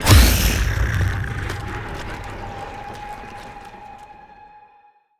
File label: Lithoid spider; Stellaris